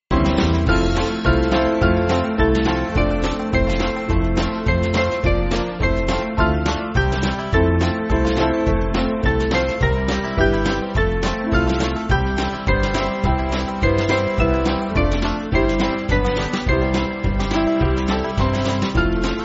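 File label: Band, Music Backing Files for Churches; The Gospel Bells; Martin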